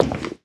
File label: Footstep, household rhythm samples; Hits